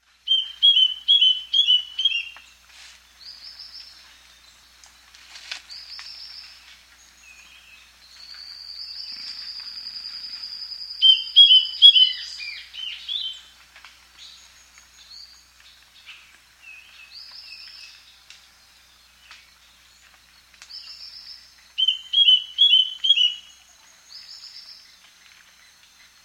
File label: Willis' Antbird, S. America